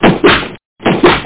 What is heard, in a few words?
paint gus